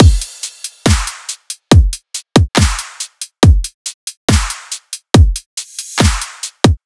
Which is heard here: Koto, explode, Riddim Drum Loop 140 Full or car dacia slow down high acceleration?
Riddim Drum Loop 140 Full